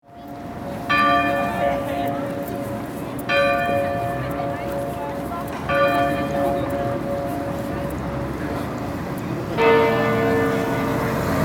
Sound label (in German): Rathausglocke